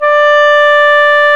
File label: Clarinets; Roland L-CDX-03 Disk 1; Clar Cls